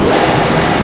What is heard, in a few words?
turret alert; source engine; hl1; video games